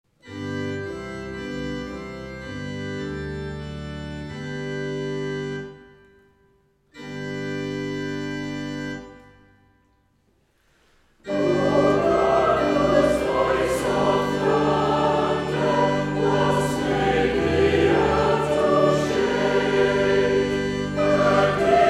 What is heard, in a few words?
o god whose voice of thunder